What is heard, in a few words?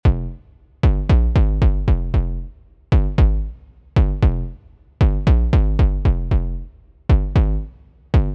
modern tech house